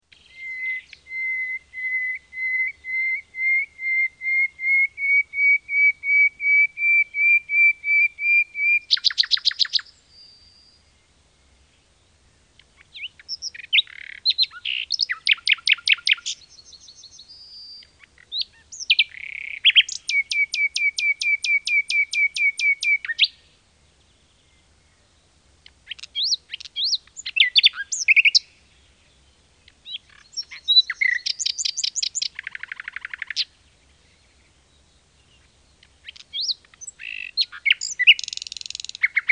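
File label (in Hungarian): Fülemüle; Madarak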